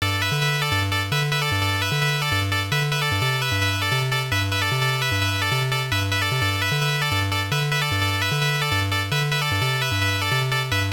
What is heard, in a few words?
BGM; pachio kun juuban shoubu; turbografx 16